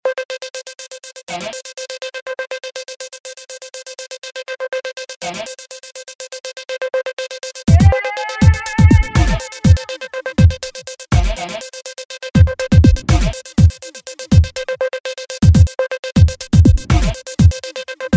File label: electronica